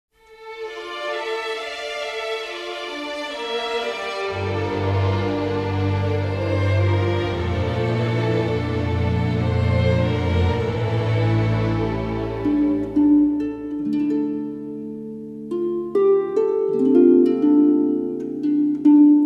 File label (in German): Harfen